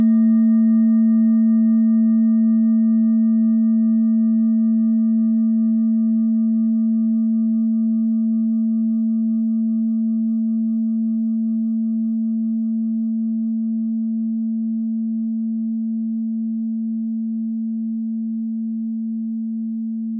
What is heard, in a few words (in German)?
klangschale set; Durchmesser = 19,3cm; Höhe = 9,4cm